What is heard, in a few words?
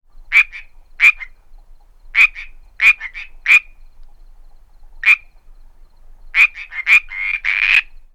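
pcadaverina group, frogs; This is an 8 second recording of a small group of frogs, including warning calls at the end.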